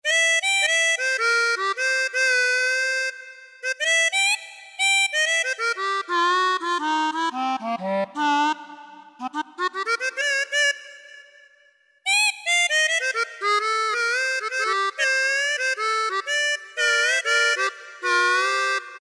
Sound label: Solo Harmon; Generalmusic S2R